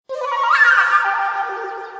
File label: Flute Trill Tones